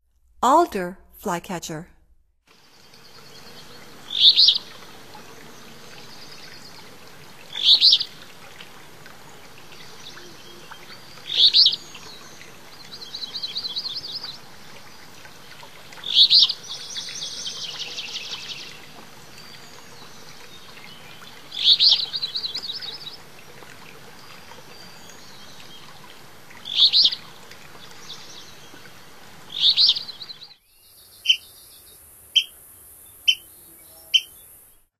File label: Alder Flycatcher, Songs of Birds of North America; Stokes